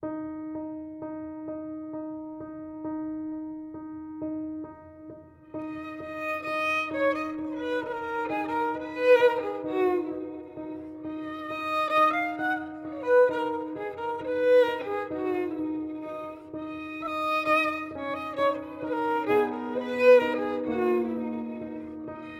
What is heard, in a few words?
violin and piano; Four small Pieces